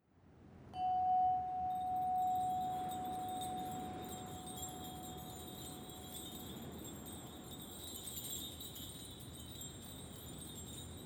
Healing Winds, Bells & Gongs, Massage Tibetan Bowls